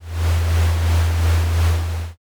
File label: heavy machine